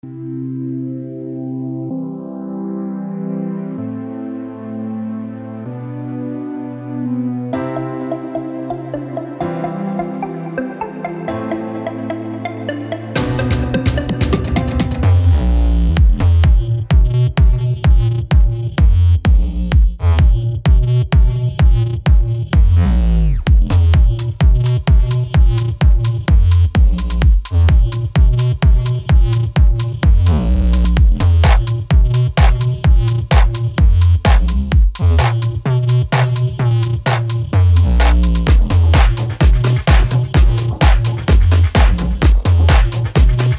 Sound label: basso suffle groovi